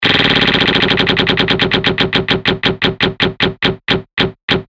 roulette

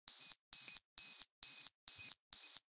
music alarm; Sony Ericsson A8i; alarms

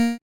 Keypress Invalid, LG Stylo 4 Plus ui

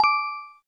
doremi 9; keytone

